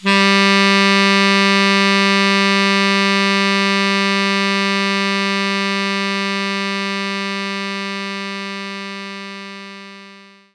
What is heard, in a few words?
Tenor Sax G2